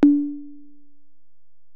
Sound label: EPerc, Alesis DM-PRO; 300 Drum Machines